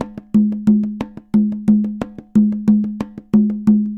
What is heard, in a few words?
Congas, Festa Latina, Drums & Percussion; Best Service; Festa Latina VOL-1